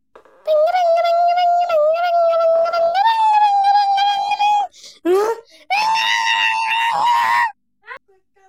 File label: birds